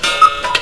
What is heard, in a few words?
coocoo